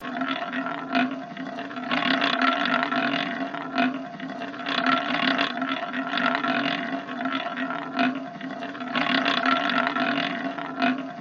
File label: table spinning, roulette